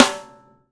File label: LD Snare; Snares